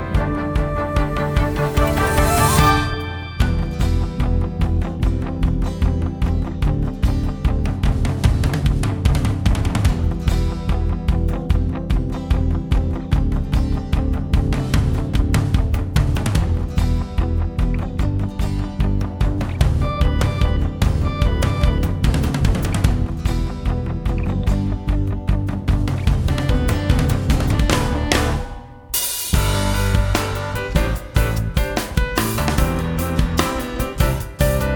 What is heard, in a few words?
Instrumental; Audition Cut